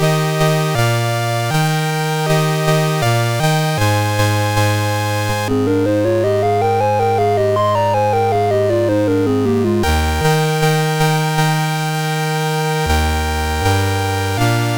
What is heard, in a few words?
1812 Overture, MOS6581R4; sid